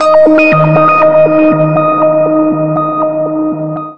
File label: Sonar; Multimedia Studio